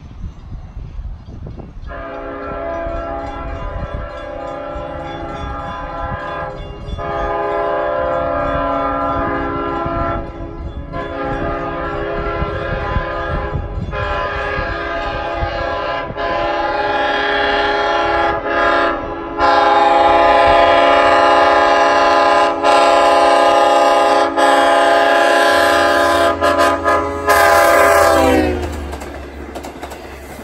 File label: blasting through, K5LL, horns; Sayville June 2019; lirrhorns